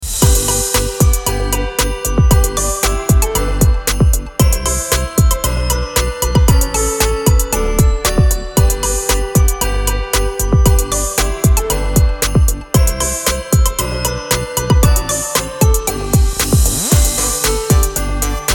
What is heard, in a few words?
jingle bells xmas background music; Eventi speciali Dicembre 2023